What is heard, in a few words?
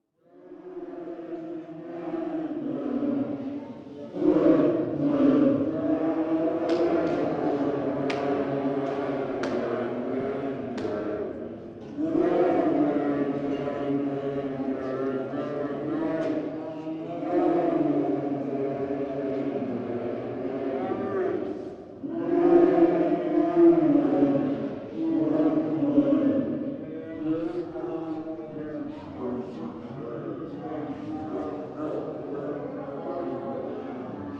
Drunken singing football fans in bar; Voice; Tom Hutchings